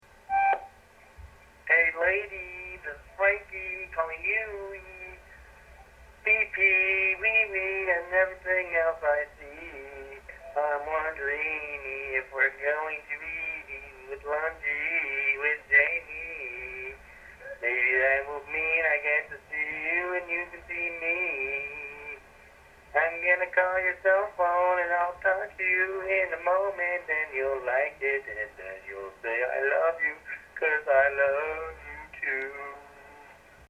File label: sings